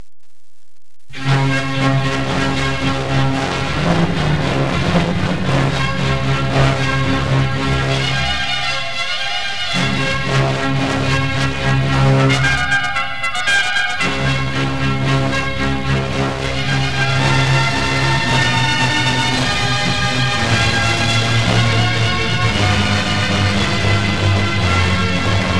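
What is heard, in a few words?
natural trumpet; Antonio Vivaldi: Gloria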